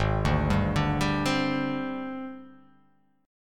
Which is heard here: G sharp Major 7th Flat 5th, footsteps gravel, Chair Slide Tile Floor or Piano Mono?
G sharp Major 7th Flat 5th